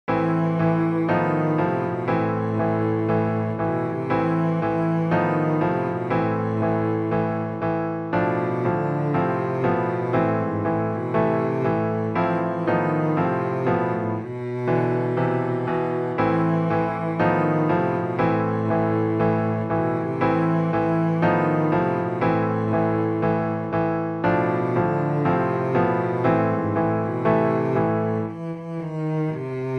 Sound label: cello; march slav